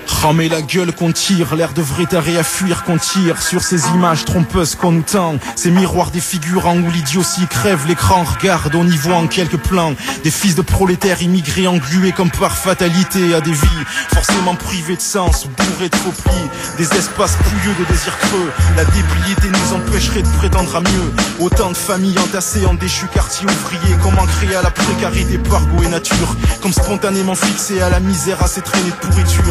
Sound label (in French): Chanson